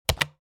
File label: Mechanical keyboard keystroke pressing enter key